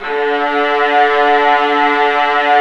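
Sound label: viola legato; Multisamples; Digital Sound Factory Virtuoso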